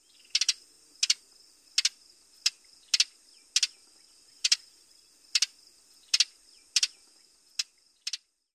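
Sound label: chet et calls, hooded oriole; AZ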